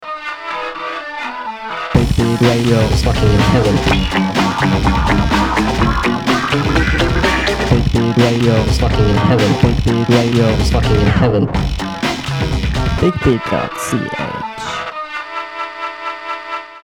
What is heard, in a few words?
Jingle